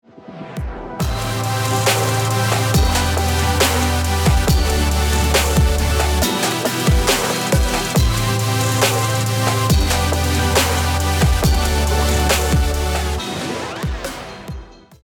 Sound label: pop